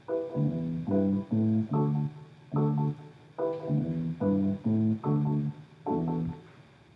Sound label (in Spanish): armonia y melodia